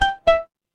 ui alert

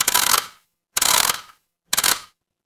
mechanical gear

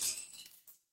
glass shatter

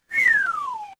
cartoon slide whistle

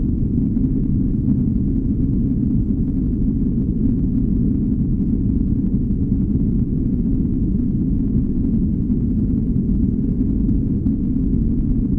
ambience rain

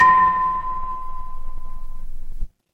instrument singing bowl

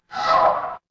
feedback fail

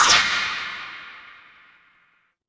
magic scifi laser

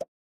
cartoon pop

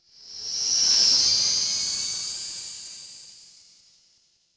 magic scifi teleport

